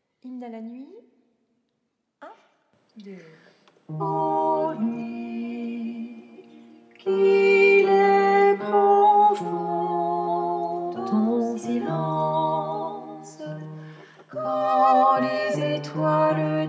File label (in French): basse; hymne a la nuit